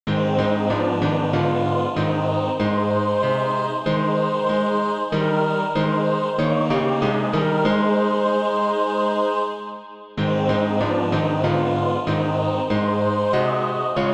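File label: mixed, SATB Practice Music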